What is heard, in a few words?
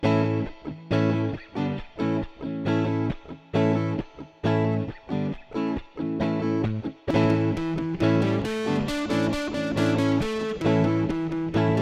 Loop; RC-30 Loops